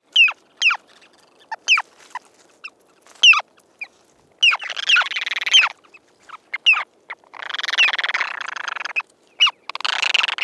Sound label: Tourne pierre Arenaria interpres on sea shore; Guadeloupe; chants des oiseaux